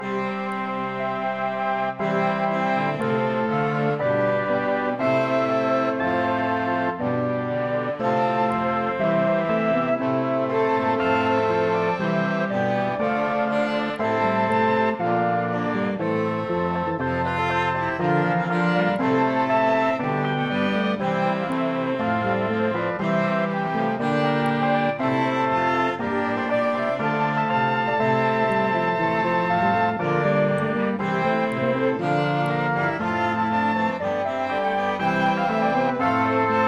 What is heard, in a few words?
recorder